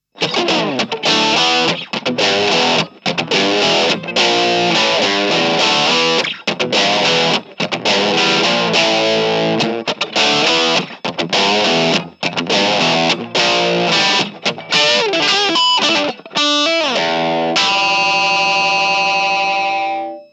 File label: Crunch